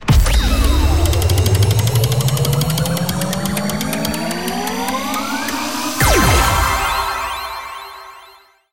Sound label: new wheel golden wheel spin; City Verse Tycoon